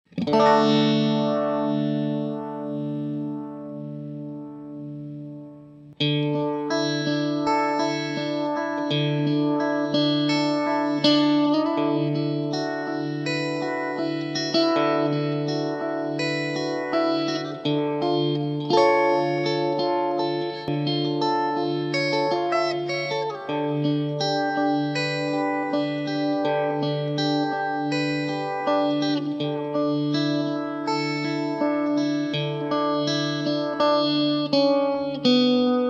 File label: Boss RT-20 setting 4, RT-20 Mode 4. Uni-Vibe sim.